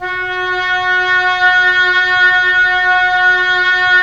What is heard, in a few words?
Oboe; Roland - LA Composer vol. 4